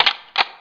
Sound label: Rif Shot